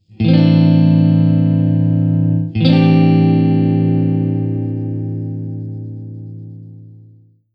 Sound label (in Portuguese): Campo Maior Acordes Am11 Am711